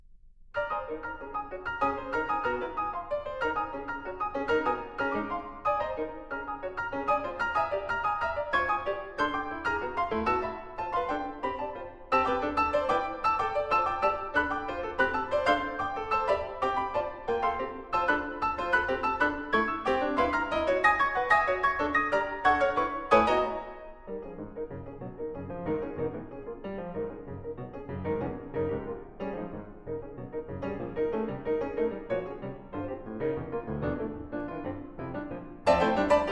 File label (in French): etudes pour piano; fem